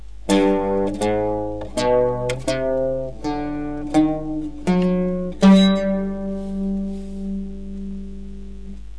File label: Maqam Shadd Araban